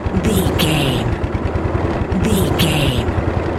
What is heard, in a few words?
engine dying slow loop